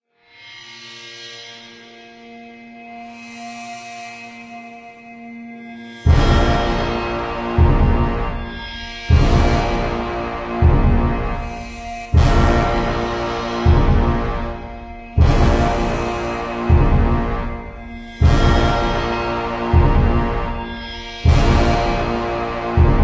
Battle Boss Intro; Infinity Blade Saga; android; xbox series xs

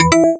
KEY Hold Finish; SAO

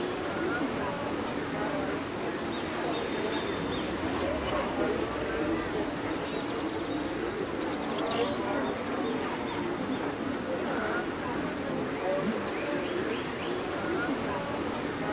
Amb Dojo Market; Fruit Ninja; Android iOS mobile gamerip 2010

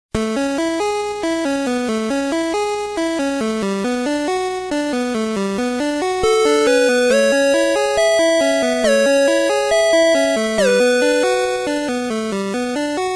Calm Tune; timothy and the mysterious forest ps4 switch windows gamerip 2019

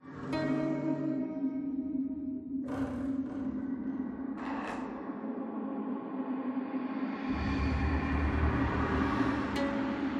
Suspense Stinger

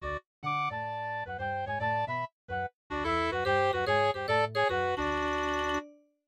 MIDI Animation stings

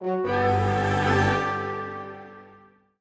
Level Popup; Scrubby Dubby Saga; Android

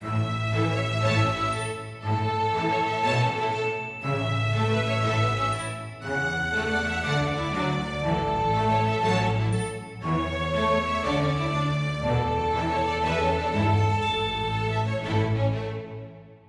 happy forte

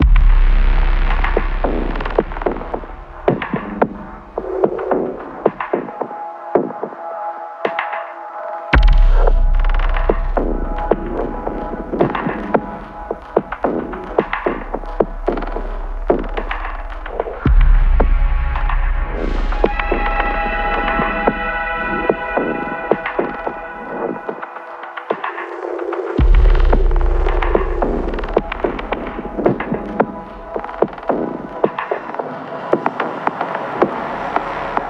Scale Pathpart2 Loop 4-4 110bpm; Furi; Windows gamerip 2016

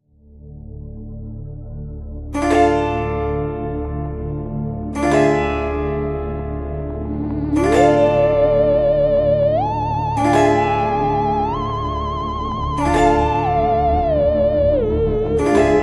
Halloween Menu; Minecraft Halloween Texture Pack

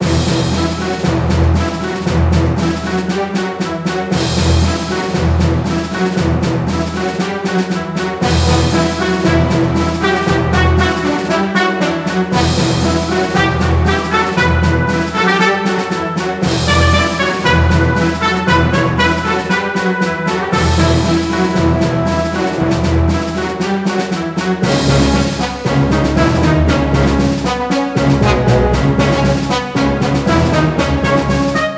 Battle; mercenaries wings the false phoenix

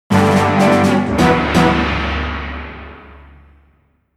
Jingle Match Start; culdcept revolt original soundtrack dynamix 2017

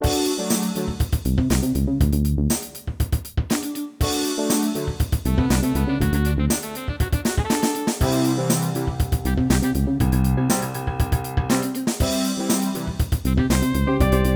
Menu; Family Bowling; PS1

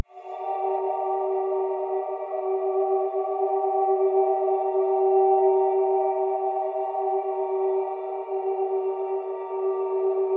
BGM caves; PS Vita

